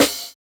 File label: Snare, jungle breaks drum sounds